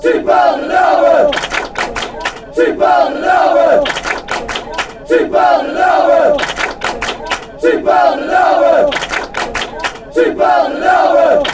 two pound an hour, fan chants

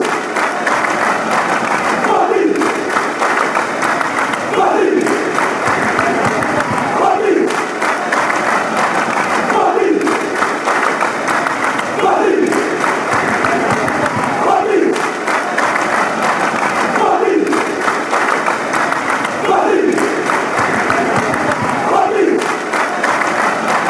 claps; sporting

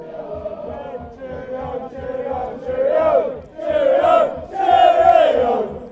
cheerio, fan chants; stevenage

